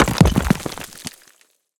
debris falling rock; bluezone; annihilation; sonniss gdc

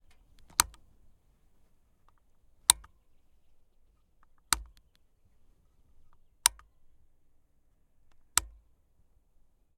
Harley Davidson Night Train stop button

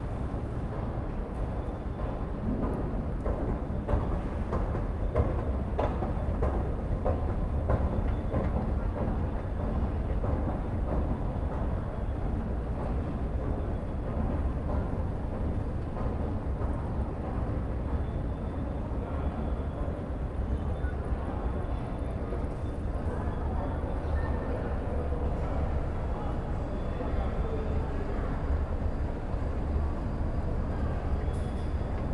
pier station escalators detail; Sonniss GDC

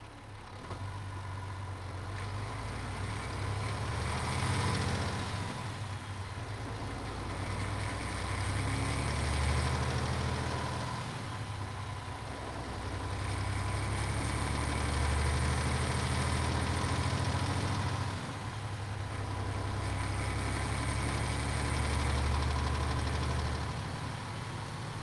man lions coach onbrd drive gearshifts engine; fvtt sonniss gdc audio

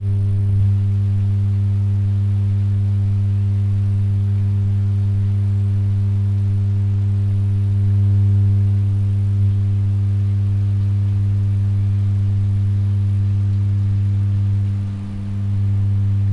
electrical power plant hum ext electromagnetic; ambind; electromagnetic design vol 2; fvtt sonniss gdc audio

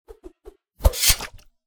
sword, weapon attacks; psfx

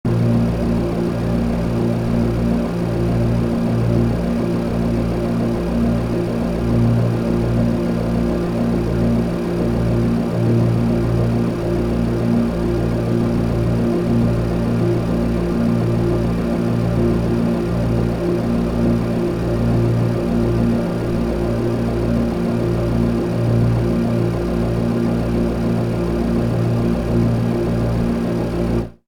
ambience scifi machine; fvtt sonniss gdc audio

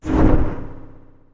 magic spells cast short push; sonniss gdc

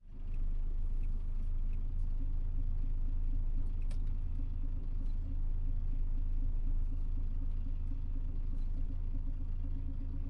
volvo f12 truck onboard slow accel through gears; fvtt sonniss gdc audio

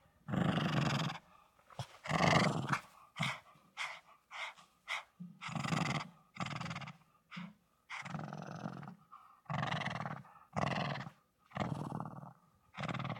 dog shih tzu growl; fienup; sonniss gdc

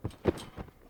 trampoline realistic

